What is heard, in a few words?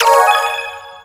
good, ui